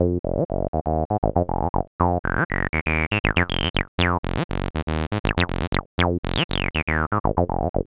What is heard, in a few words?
TB-303 LOOPS